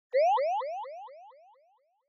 Anime Motion Delay

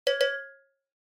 Cowbell (Dry)

